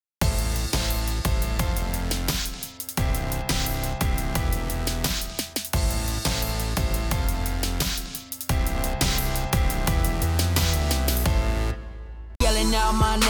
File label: MUSIC; RINGSIDE SIZZLE; TV1